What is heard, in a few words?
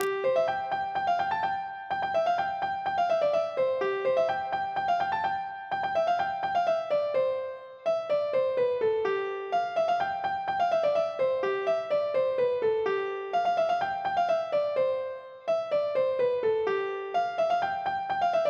polka; boeuf trad